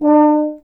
Tuba, Solo Instruments; Miroslav Vitous

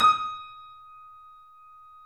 Small Pianos, 3-D Audio Collection; E-MU Producer Series Vol. 5; 3D Multis

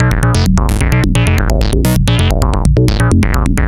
Analoop, Industrial Analog

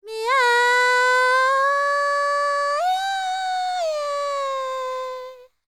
World Voices, INDIA; ILIO; Vocal Planet

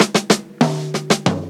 Drum Roll; Jungle

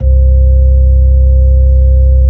B-3, B-3 Brite; Xfade; Keyboards of The 60's and 70's

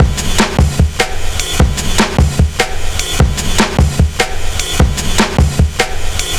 150, Drum Loops; Total Drum&Bass

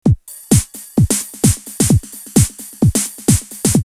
909 HiHat Delays 130, drumloops, DrumMachines; X-Static Goldmine

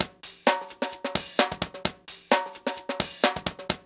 130, DRUMLOOPS

